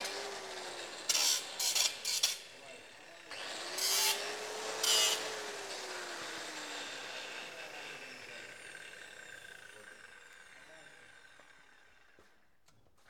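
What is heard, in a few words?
Saw Iron Electric Slow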